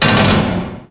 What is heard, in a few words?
kchunk, doors; Heretic II Demo